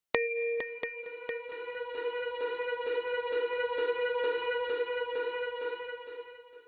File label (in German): Alarm